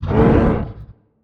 damage; ENDWORM

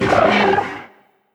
HUSKED CRAWLER damage

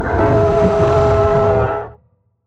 attack; CHARYBDIS

